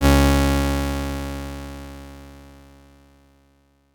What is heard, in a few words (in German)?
Gesamt Chor D 415Hz, Lauten; Chor 8 (Dd) (Saiten 14 und 15)